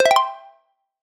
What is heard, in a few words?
happy, sfx